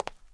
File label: footsteps; Star Wars Obi Wan; XBOX